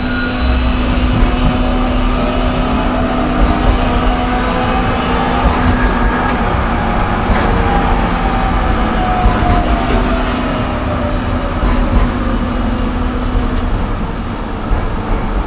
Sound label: running, tram